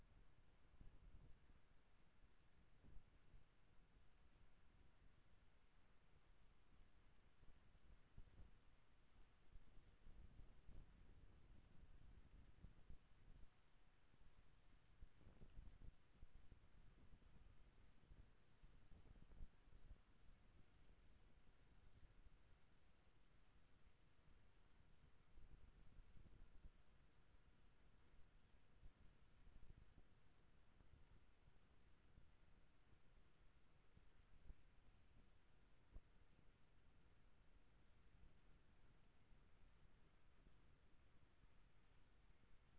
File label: HYDROPHONE 16000Hz; JASCO AMAR HYDROPHONE 740